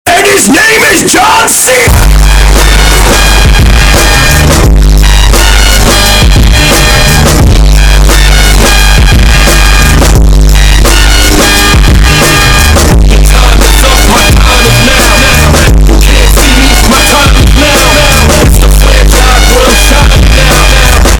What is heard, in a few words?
Klassika Tihaya melodiya